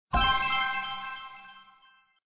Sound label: match; fairies